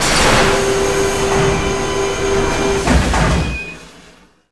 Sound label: ramp open close